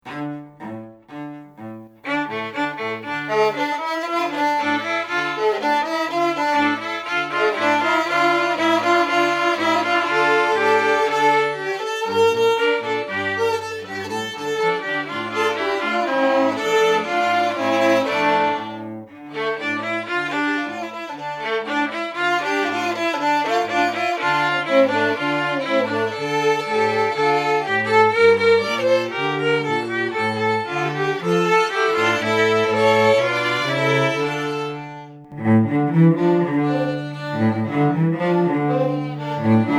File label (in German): Bella Ciao Streicher; Als Protestlied wurde „Bella Ciao“ zu Beginn des 20. Jahrhunderts von Feldarbeitern